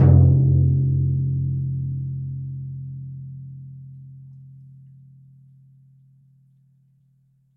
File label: Timpani Large